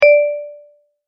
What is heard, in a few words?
multimedia tone correct